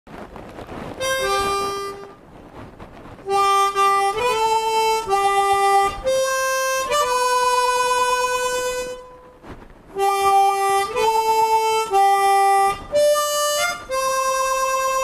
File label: Harmonica song